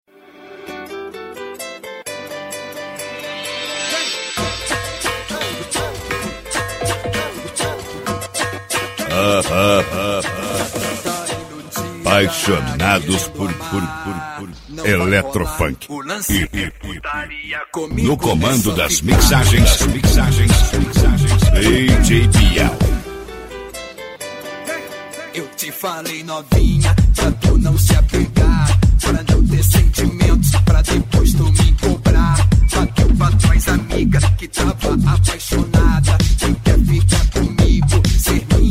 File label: electro funk